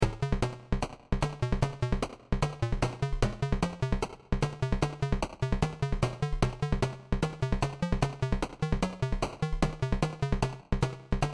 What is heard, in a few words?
8 bit